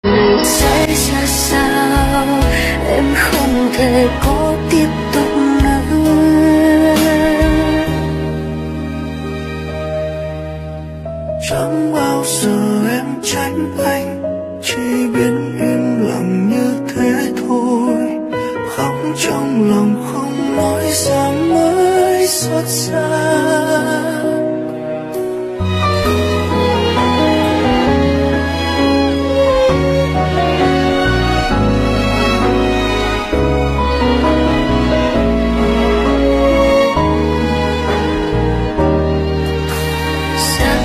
Những Bản Nhạc Buồn Tâm Trạng

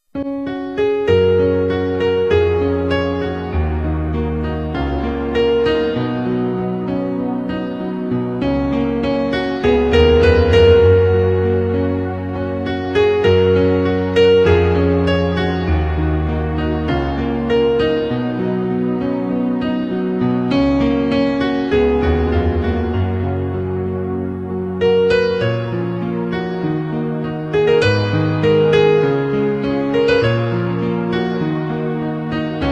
Hòa Tấu Piano

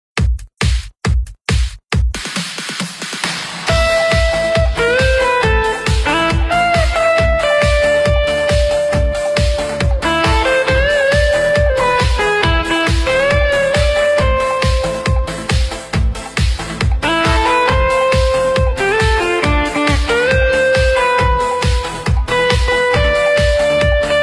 Nhạc Sống Remix Cực Mạnh

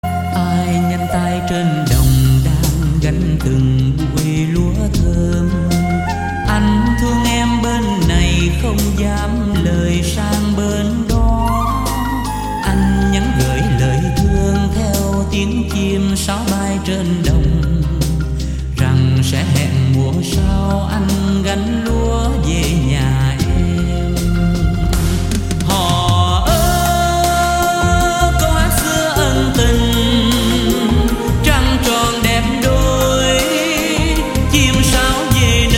Nhạc Chuông

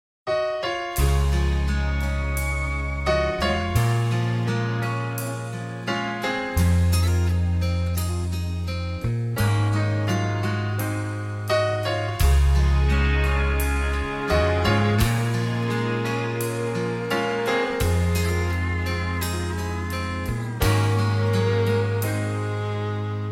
Nhạc Chuông

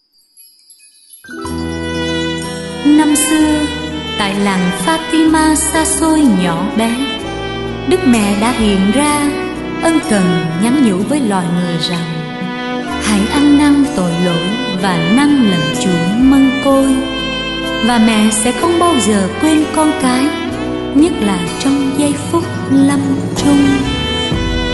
Nhạc Chuông

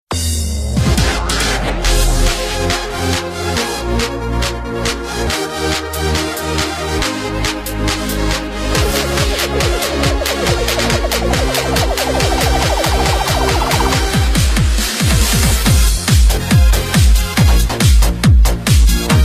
LK Nhạc Trẻ Remix

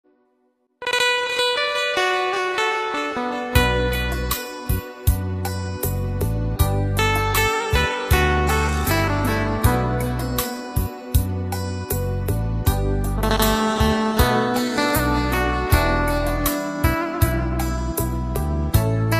Guitar Phòng Trà Không Lời Liên Khúc Hòa Tấu Rumba Bolero

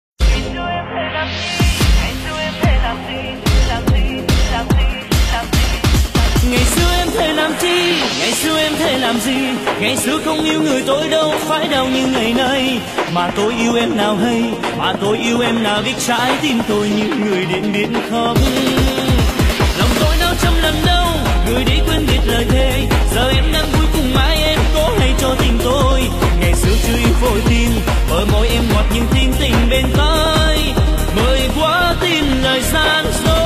LK Nhạc Trẻ Remix Bass Căng Nhất, Nhạc Chuông

